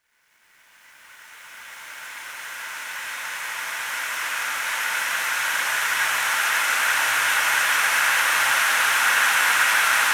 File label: Trickle Rain; Chalice Well